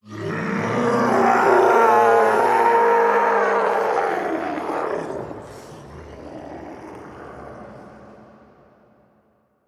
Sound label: Vocal Scream; The Town Intro Sequence BaSam; Devour 2021 gamerip pc macos